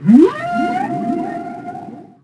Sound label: Whale Call; Finding Nemo; XBOX